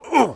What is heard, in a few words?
Player Hit; Testing Sandbox